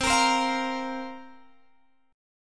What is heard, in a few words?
C 7th Suspended 4th, Guitar, Open G